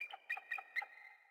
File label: sting eagle; Rust